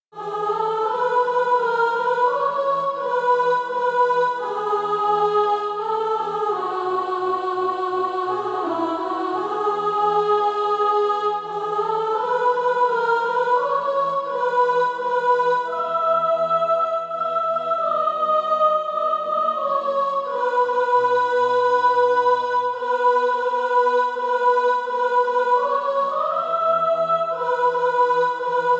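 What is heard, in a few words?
soprano; Do You See the Hebrew Captive Kneeling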